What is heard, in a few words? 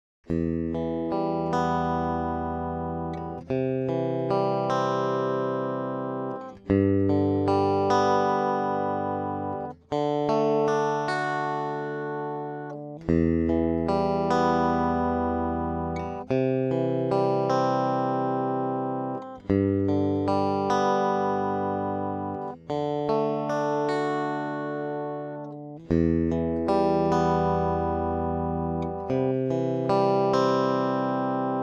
strat